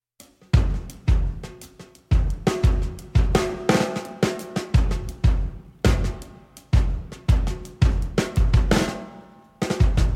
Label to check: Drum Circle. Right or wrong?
Right